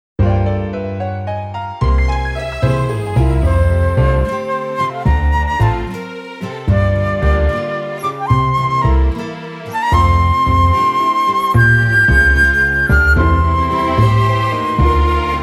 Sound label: Lullaby for Trains; Pokemon Black and White